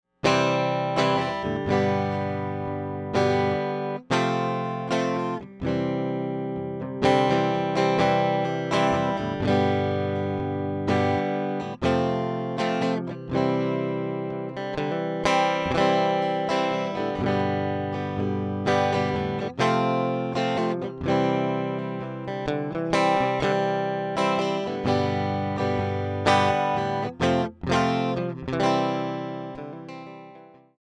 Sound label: strat stock clean rhythm